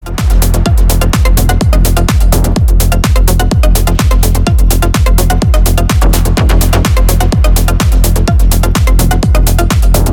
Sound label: techno